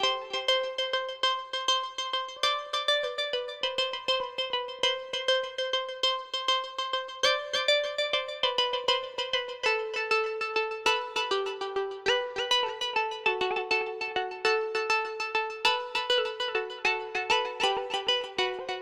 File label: synth Liquid Keys 100bpm G